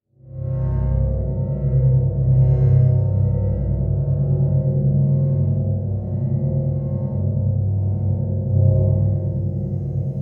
Bed Pulse Digital To Sweeper; Bed Sound Effects

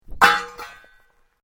METAL OBJECT CRASH; Metal Sound Effects; Metal (Sound Effects:Materials)

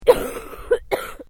FEMALE COUGH STRONG; Female vocal sounds; Clearing Throat (Sound Effects:Human)

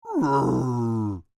Creature Speak Moan, Human; Groaning Sound Effects; Voice

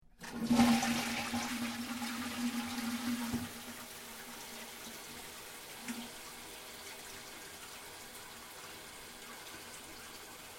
TOILET JAPAN FLUSH; Toilets; Bathroom; Household

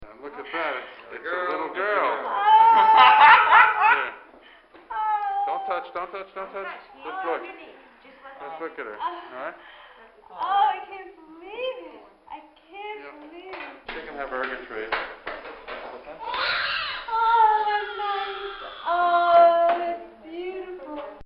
Childbirth, hospital; Hospitals

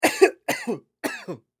Human Male Cough

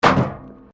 METAL BIN IMPACT PLASTIC CRASH BARRIER

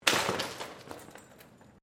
BICYCLE MOUNTAIN BIKE FALL CONCRETE; Bike being thrown around to simulate crash impacts; Bicycles

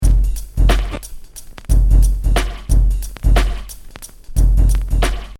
Hip Hop Drum Loop 90 Bpm Swing With Vinyl; Next Door

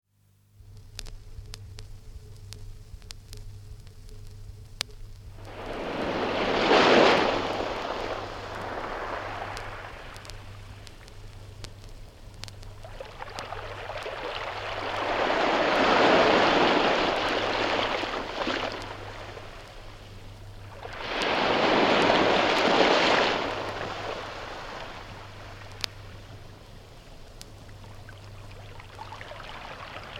Heavy waves breaking on rocks (from 78 disc); Ocean; BBC

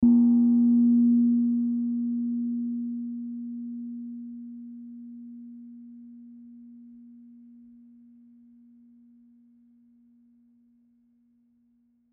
Gong Small Hit, Gong Small Hit Soft; Cymbal Sound Effects; Blastwave

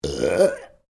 Burp female medium, Human Burping

